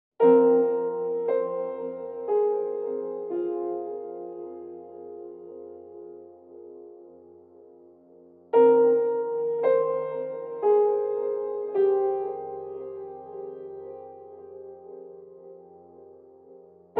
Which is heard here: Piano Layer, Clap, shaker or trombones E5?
Piano Layer